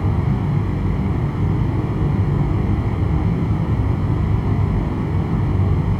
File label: Panned Noise, non tempo loops